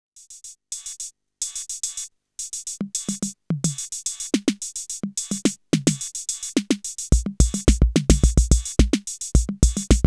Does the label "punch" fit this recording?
No